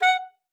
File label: F#5, alto sax; Xsample VOL-2